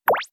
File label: Drip